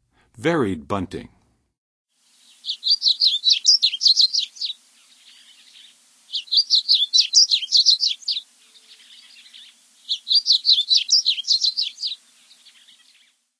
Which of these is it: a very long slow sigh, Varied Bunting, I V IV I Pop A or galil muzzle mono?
Varied Bunting